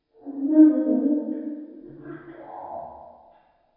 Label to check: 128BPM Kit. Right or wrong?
Wrong